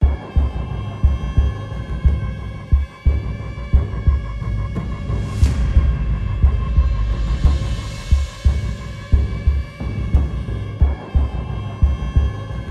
Whisk Loop; batman arkham asylum

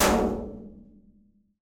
metal hit

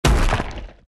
Break Block; Fancy Pants World 1 Remix

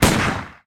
Gun; ChooChoo Heroes

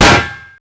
CLANK

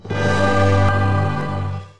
victory fanfare short; Voidspire Tactics

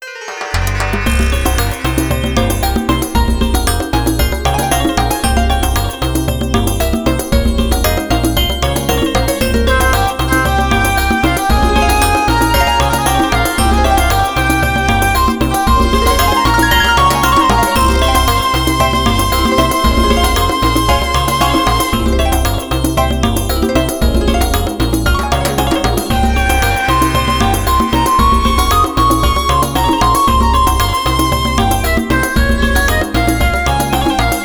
Shop BGM; Trouble Witches Origin; Daughters of Amalgam

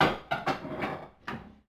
Ambient Kitchen; The Princess and the Frog; wii windows; Hub Restaurant; Act Kitchen; Oneshot

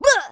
crash; Tom

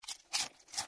Eat; Yet Another Pixel Dungeon; Android

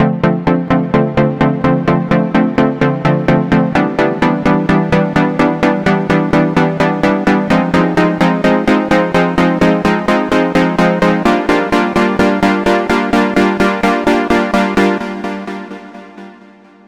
Synth Main; super crush k.o.

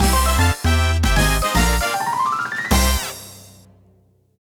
Success; Super Mario Party Jamboree

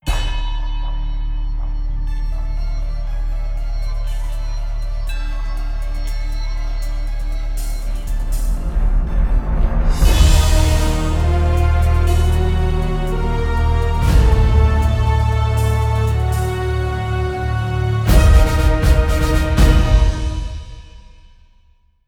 Game Over; Gorod Krovi

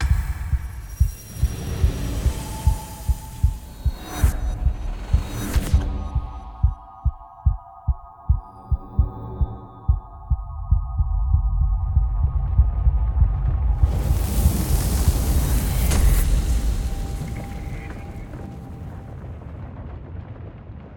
defeat; gasha

